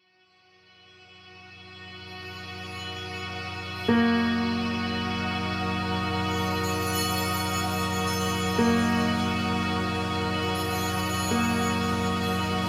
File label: BREATHING